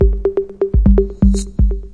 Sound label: 808 percussion echo, drum loops and breaks, 123 bpm